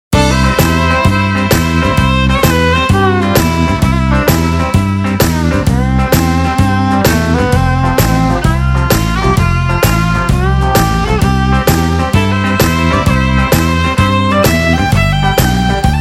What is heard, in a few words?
COUNTRY JAM